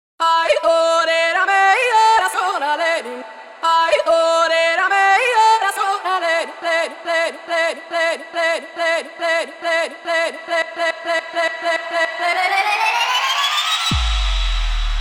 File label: Buildup Drop